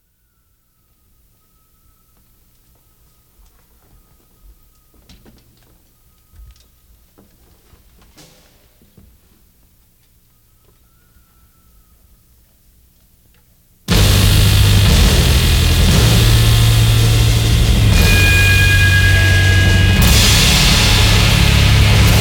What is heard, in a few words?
Waves